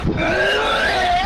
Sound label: weird uwu vomit